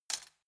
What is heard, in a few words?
cutlery plastic drawer place